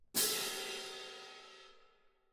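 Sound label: Cymbal, Jazz for piano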